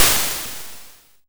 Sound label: Snare, YM, CHIPSHOP HITS DRUMS; ChipShop Deluxe